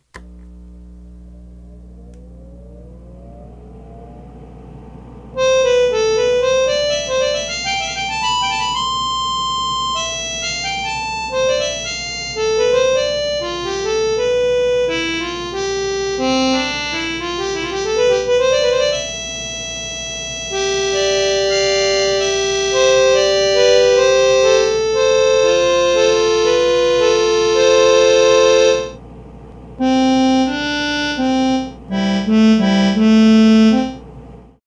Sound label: Supertone noise dry, Reeds